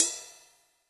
Ride, Cymbal; Yamaha DTXpress-II

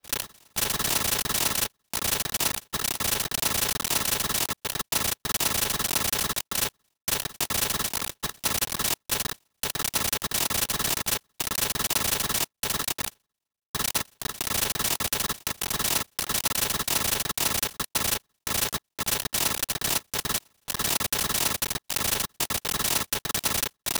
glitch noise

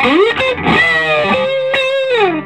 SOLO, Vintage Blues Guitar

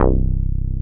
DANCE BASS; SYNBASS

